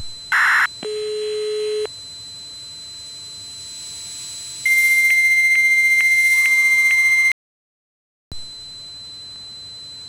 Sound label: nmt attempted data connection